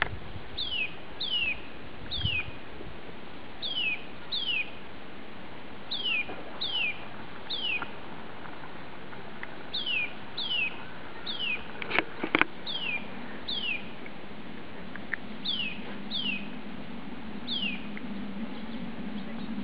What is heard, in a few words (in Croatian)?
brgljezov proljetni zov